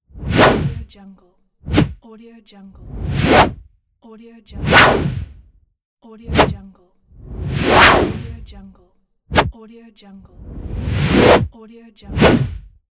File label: transition wooshes